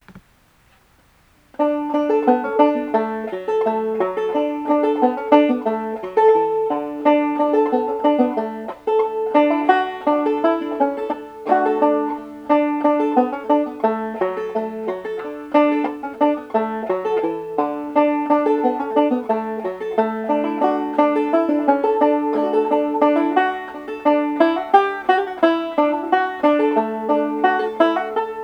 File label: john ryans polka, banjo